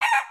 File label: cluck; creatures organic